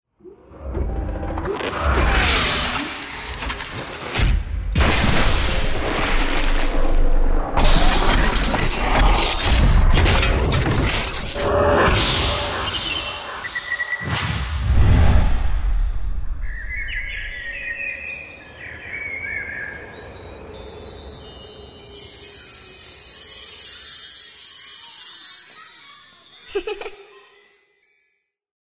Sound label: SFX